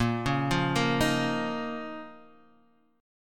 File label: D sharp 7th Suspended 2nd A sharp